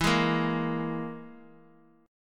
E-Major Flat 5th E

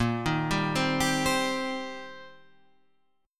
C Minor 7th Bb

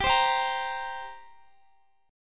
G Minor Double Flat 5th, G, Guitar; Gmbb5; strummed

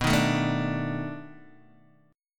B 7th Sharp 9th B down Guitar Open D

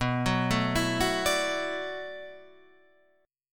D 6th Add 9th B

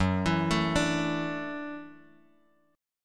Gb Minor 7th Sharp 5th, Bass Standard; arpeggiated; JGuitar

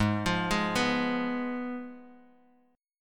Ab Minor 6th, down, Guitar, Open F; arpeggiated

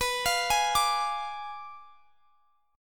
B Diminished 7th B down Guitar Standard; arpeggiated; capo 9

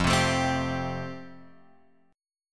F Major Add 9th; Fadd9 Chord {1 3 X 2 1 3} strummed

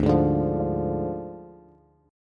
D Diminished D, Bass; strummed

